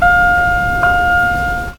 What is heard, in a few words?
elevator down